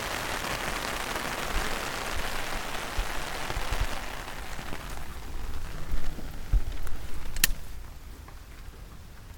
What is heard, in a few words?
umbrella rain